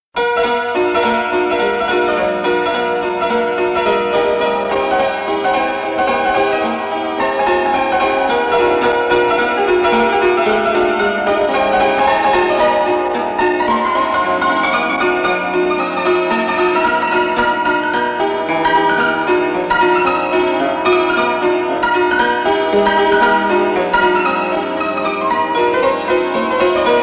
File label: honky tonk